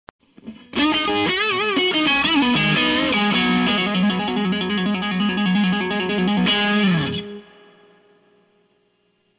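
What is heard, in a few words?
RG100 Gain Reverb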